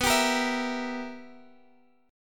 B Major 7th Suspended 4th Sharp 5th B down Guitar Standard; strummed; JGuitar